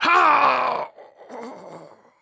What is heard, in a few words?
death; Counter Strike Model Pack; CS:GO; phoenix coop